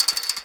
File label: fence jump; firefight